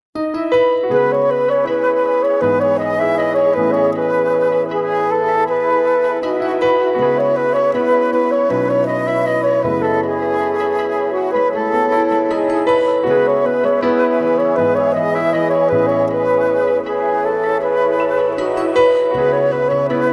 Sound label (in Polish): wersja instrumentalna / podkład; Krakowskie Centrum Artystyczne